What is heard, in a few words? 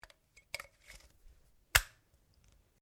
RIFLE CZ 452 VARMINT 22 CALIBRE MAGAZINE ENGAGE; A selection of domesttic firearms; Rifles